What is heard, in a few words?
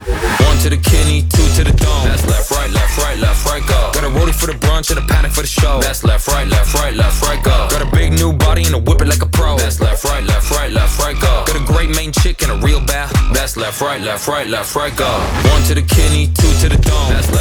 Two Step; Tampa